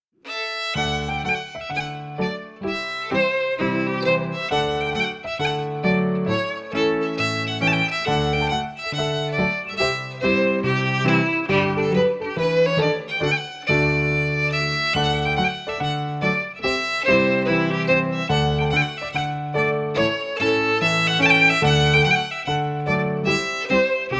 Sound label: FIDDLE PIANO; Jink & Diddle Book o' Tunes 2022